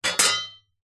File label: impact metal object bracket plate hit impact metal tube